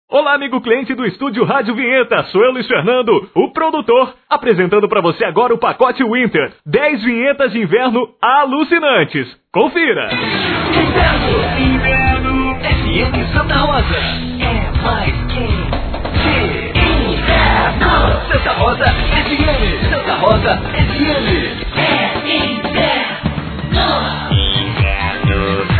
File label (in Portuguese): vinhetas winter